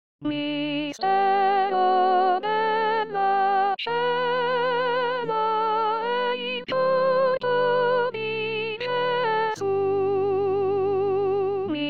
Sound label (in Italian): Soprani